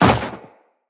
Run Snow, Footsteps; Tenno

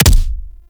Bayonet Punch; Warframe; Tenno

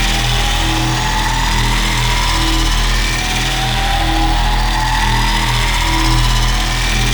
Modular Primary Barrel Laser Loop, Weapons; SU Modular Guns; Barrels

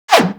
Bullet Parry Metal; Impacts; Bullet Parries

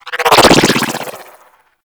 Flak Drone Proj By

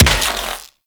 Rifle Shot; Warframe; Infested; Quanta

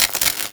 Worker Welder Start; Warframe; Grineer

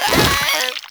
Infested Runner Jump Whoosh F; Enemies; Abilities; Warframe; Proto Infested

